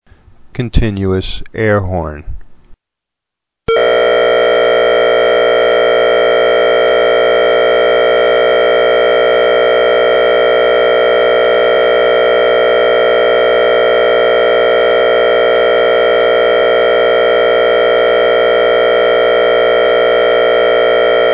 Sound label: hurricane warning air horn